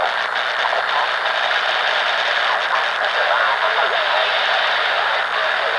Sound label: signal gear